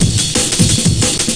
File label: 177 firefight, drum loops and breaks; 161 to 180 bpm